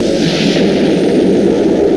FAUCON; STARS FXS; East West; Global Sounds

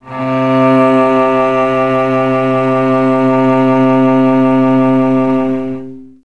CLS LGP C2-L; Best Service; Peter Siedlaczek's Advanced Orchestra

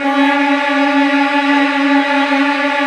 VN TRILLS, Virtuoso Strings

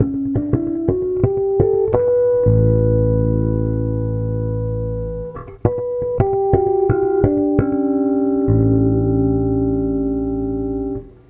Bass

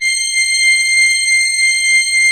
C6, Cathedral Organ; ILIO; Propeller Islands Cathedral Organ

